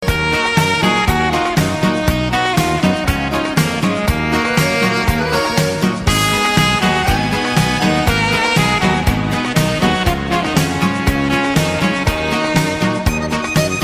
sax

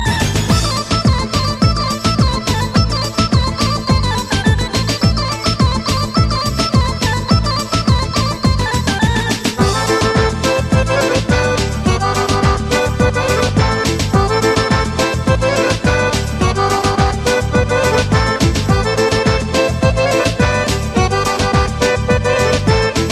instrumental

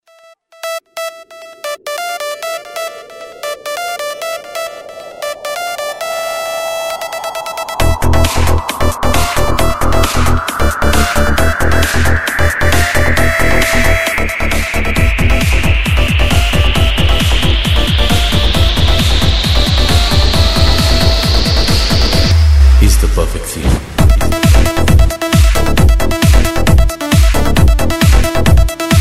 ringtone

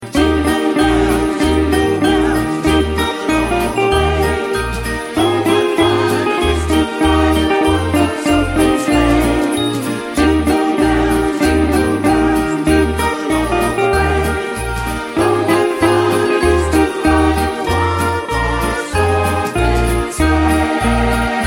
jingle bells pop

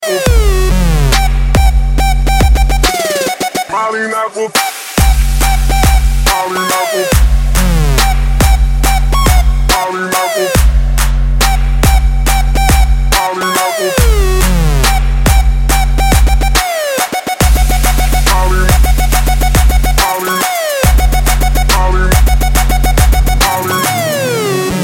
trap

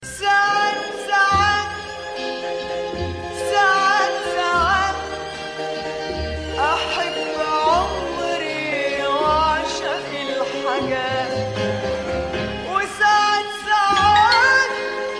romantic

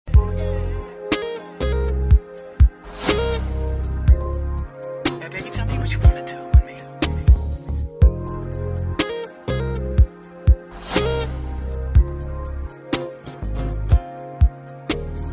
nice n slow

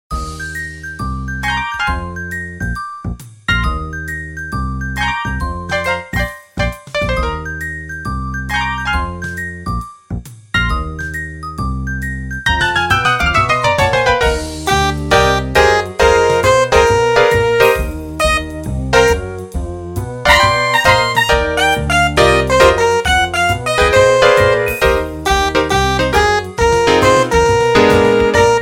nokia slowdown

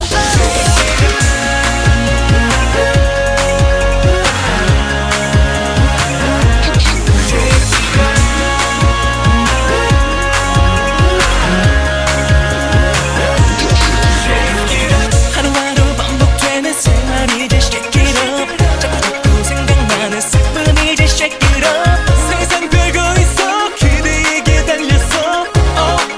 remix

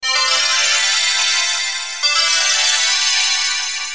message tones

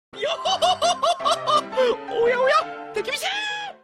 laugh; one piece

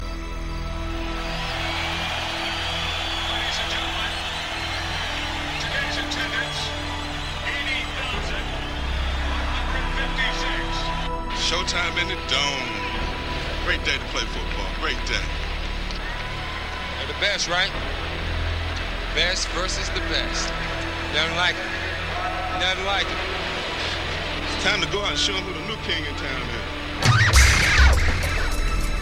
feel the crowd

